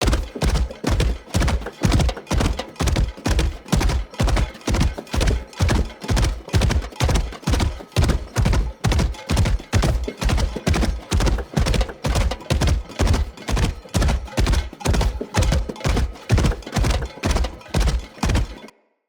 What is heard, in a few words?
Corrupted Battle Dog Run Loop, Foley Footsteps; Animals